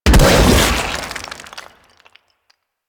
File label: Infested Bone Blade Mortar Proj Impact; Warframe